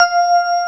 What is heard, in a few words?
Maletto, f6; BGM; Nintendo; July 2020 Gigaleak